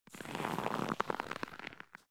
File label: Rock; Blind Quest - The Frost Demon